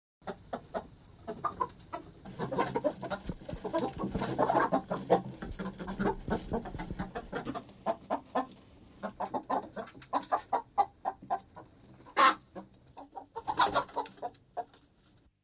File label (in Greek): Zwa Kotes